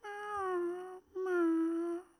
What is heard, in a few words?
Grub Sad Idle